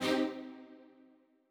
Strings

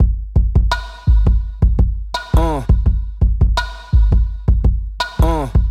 Break, Snares

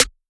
SNARE

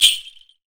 PERCUSSION

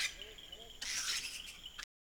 FX

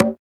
Perc

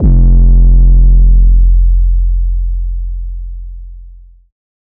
808 F; Cymatics

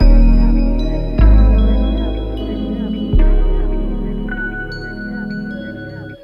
Loop, 77 bpm

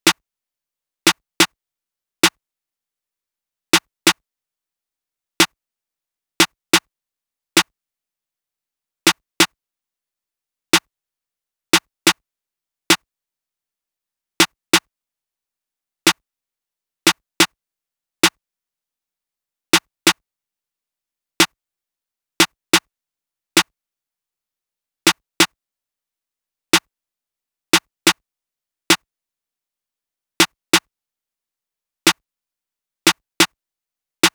side snare loop bounce 90; Snares

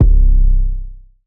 808's

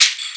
shaker hit west coast; Percs

